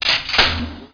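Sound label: elevator; MOTS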